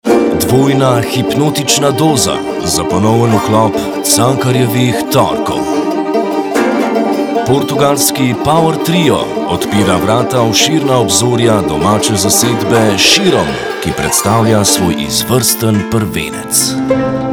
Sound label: power trio; cankarjevi torki; cankarjev dom